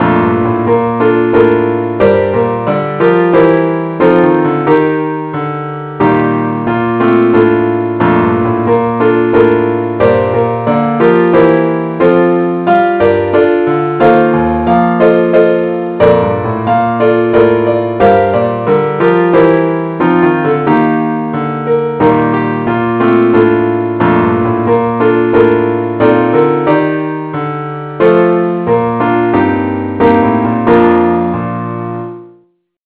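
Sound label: keyboard only key b flat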